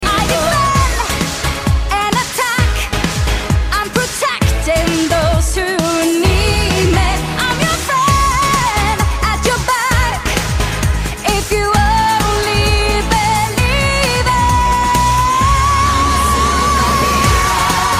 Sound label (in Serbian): pesma